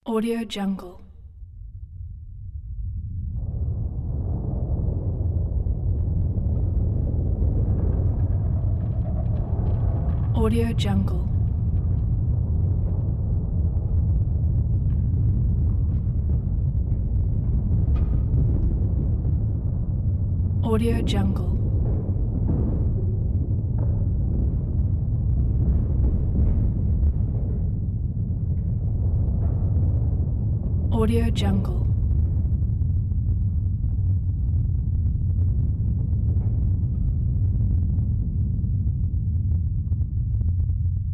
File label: earthquake